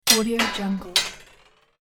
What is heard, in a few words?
metal machine switch lever hit; اهرم سوئیچ ماشین; industrial sounds